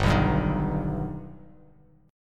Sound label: G Augmented, Bass Standard; G+ strummed; 3,2,5,8; JGuitar